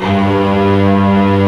FL STRINGS G2

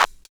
combi drums

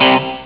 set guitar; Amiga Soundtracker sample

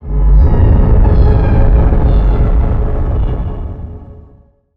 Texture 4 - C; 99Sounds; Monosounds; Music Loops; Instrument Oneshots

